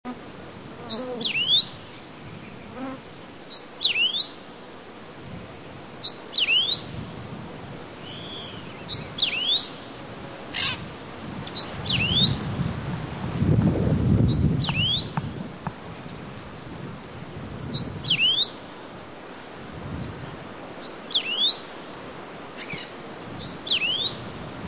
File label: wedge tailed grass finch